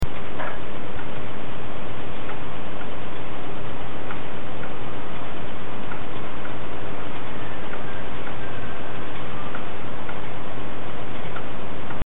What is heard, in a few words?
whistle; N15StSpfldIL